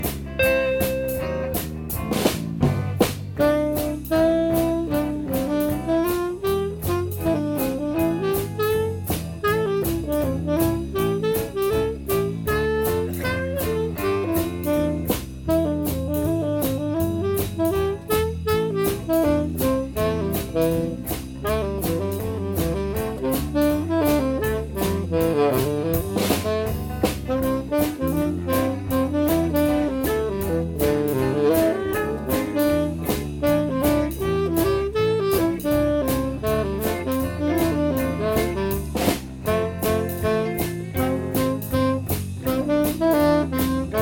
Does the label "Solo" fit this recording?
Yes